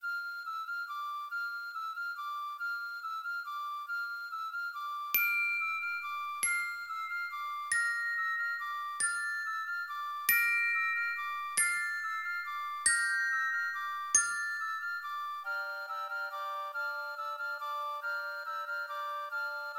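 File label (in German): Lyra, 140 bpm; Carol of the Bells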